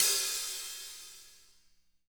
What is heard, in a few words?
hat slosh